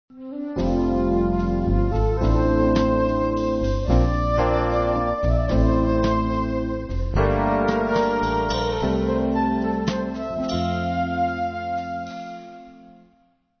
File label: Transition, transitions